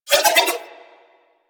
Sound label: Nunchaku Prime Spin Whoosh; Warframe; Tenno